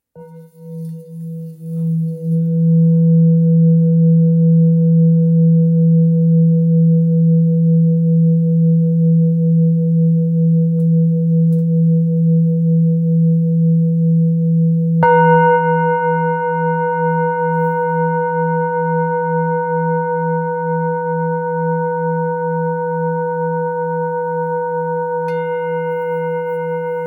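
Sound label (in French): Bol super lune belier mi, bols chantants tibétains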